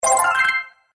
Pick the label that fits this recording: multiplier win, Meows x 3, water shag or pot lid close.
multiplier win